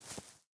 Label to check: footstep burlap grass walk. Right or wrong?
Right